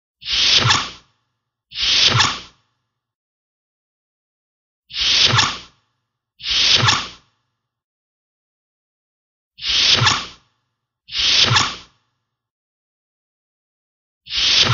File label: Sound Effects Door Swoosh; Star Trek TOS